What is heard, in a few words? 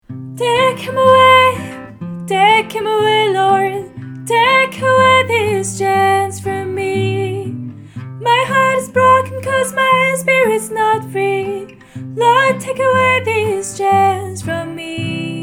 higher voice